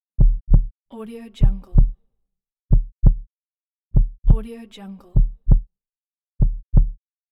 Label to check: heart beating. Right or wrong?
Right